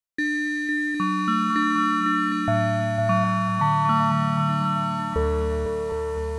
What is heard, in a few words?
ringmod